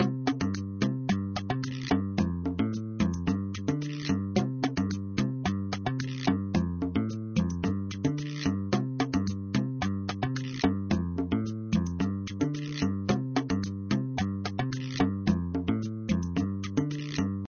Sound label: Boombox Music; Papa's Cupcakeria